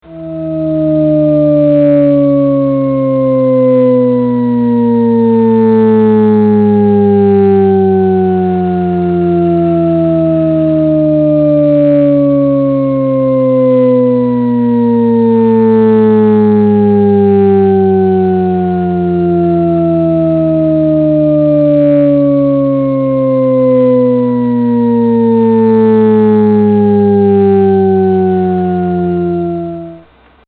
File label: shepherd falling